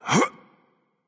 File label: grunt jump; smite